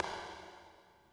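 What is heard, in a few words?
echo run, footsteps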